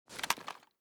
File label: mossberg reload end, weapons; Mask's Reanimated Anomaly Arsenal